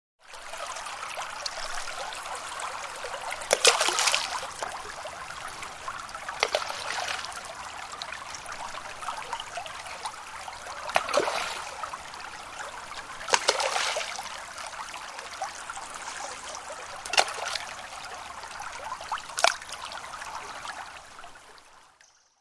Stones In Stream, Plopping sounds of stones being thrown into a stream; Splashes; Objects